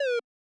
siren beeps dry; Deep Space Textures V2

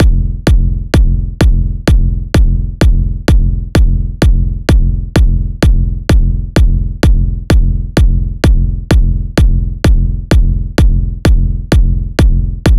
128 EDM kick

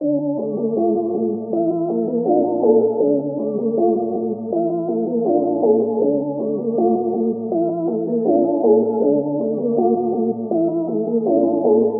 guitar loop, Dmin, 160

